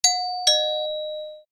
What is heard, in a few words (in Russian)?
zvuk zvonka